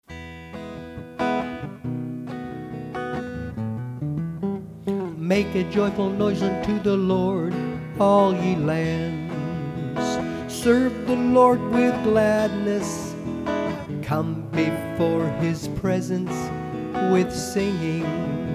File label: PS 100 THE PROPHET VOCAL; The Fivefold Psalms